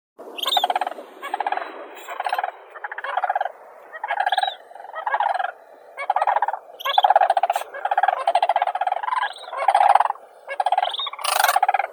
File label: zhuravl kanadskii grus canadensis; zvuki prirodi